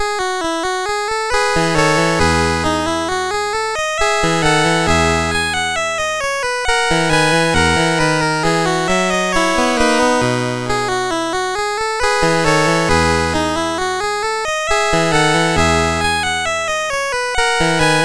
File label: Minuet, MOS6581R4; Joseph A Grau; Sixth French Suite